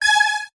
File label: Beep Long